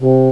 Horn (C2); X-Wing Patches

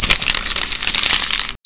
paper

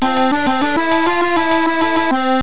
move End; すちゃらか村

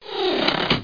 raven tower door close

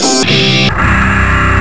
power chord, guitar samples; Amiga-CD 1996 #5-6; sampler; Amiga Magazin

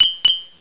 people; The Great Golf CD

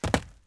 gravel land; urbanchaos

